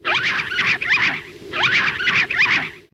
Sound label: Roar; Spider Uros